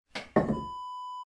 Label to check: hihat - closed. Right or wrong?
Wrong